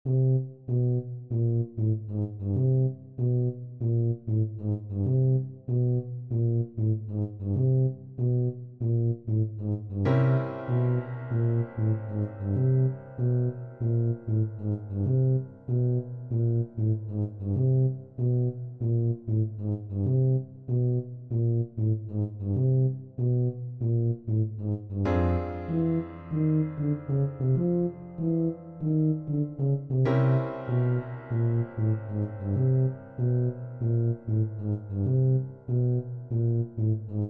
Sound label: Tuba